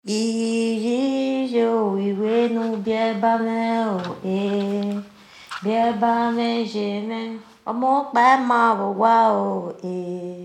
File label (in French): comptine